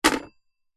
household telephone vintage receiver slam down hang up hard bell ping bakelite